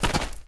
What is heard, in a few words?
dirt land, footsteps; chief